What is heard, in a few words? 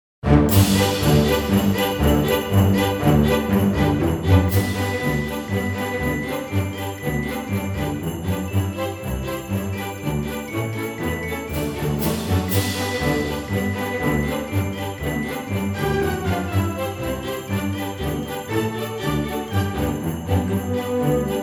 Christmas fanfare